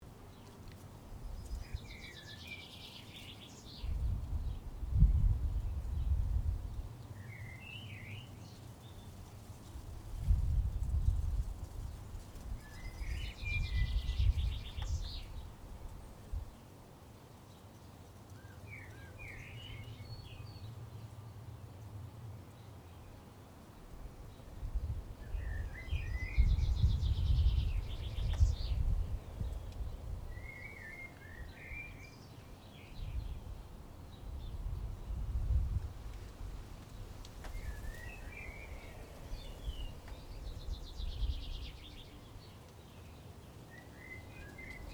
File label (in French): Bird Campagne